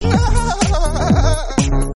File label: german sparkle party; ringtone